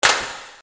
Percussion, EuroDance

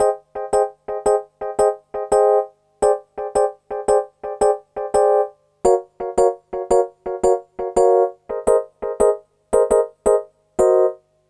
Rhodes

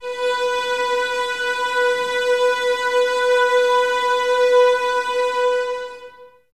VS B 4, 11 Violins, Mute; Miroslav Vitous; String Ensembles

